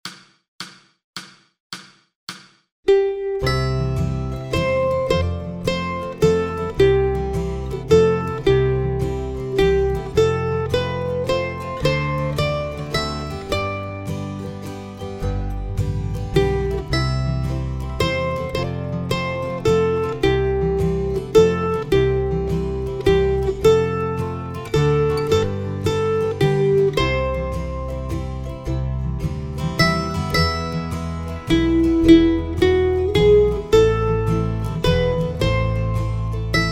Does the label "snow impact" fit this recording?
No